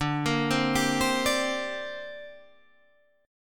D 7th Suspended 4th Sharp 5th down Guitar Half Step Down; D7sus4#5